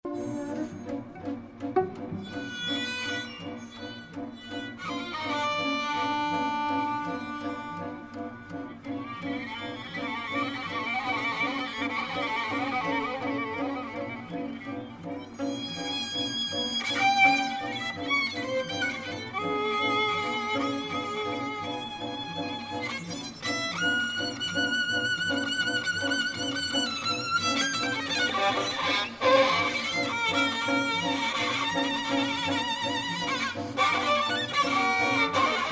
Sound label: Techno